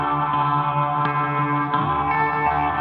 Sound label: Gretsch Ring 170 D, guitar drone